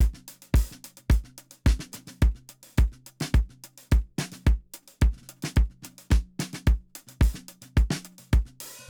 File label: 70 s Vibe 108 bpm, drums; Basement drums vol 1